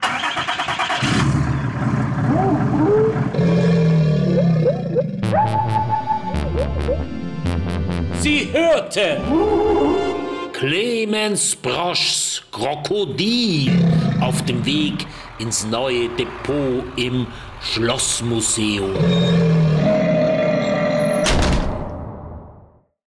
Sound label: radio jingle; krokodil; orf